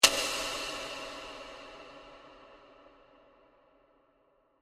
Hit Light Switch, Light, Switch, Flick, Off, Haunting; Production Element; BlastWave